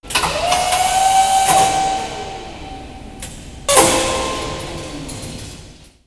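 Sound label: Off!, electronic fx; X-Static Goldmine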